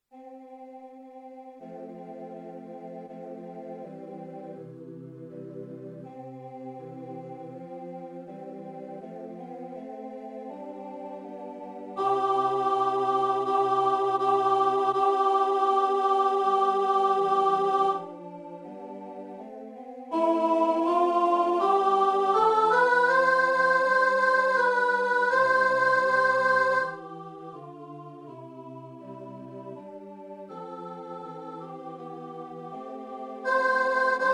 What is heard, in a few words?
Soprano, rehearsal